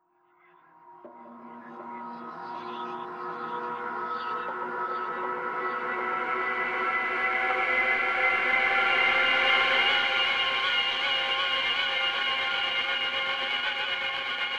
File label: Pads 120; Dew Point